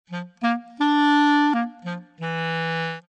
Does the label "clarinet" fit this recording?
Yes